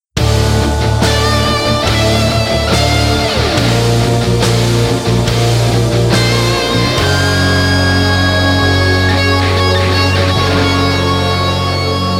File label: lose; radiant; stingers